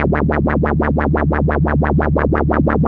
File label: ARP 2600 C3, synthesizer; Hollywood Edge - Giorgio Moroder Rare Synthesizer Collection